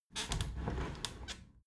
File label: window handle squeak turn open halfway soft; objekte; fenster